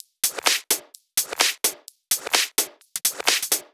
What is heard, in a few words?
Drumloops 128BPM